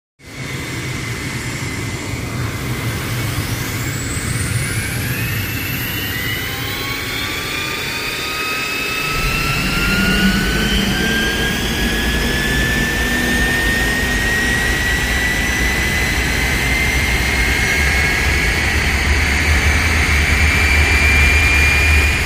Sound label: Start / Idle; Ignition With Many Stages, Sweeping Whistles And Air Intakes, Engine Catches Before Settling At A Steady Idle. Close Perspective. Jet.; Gnat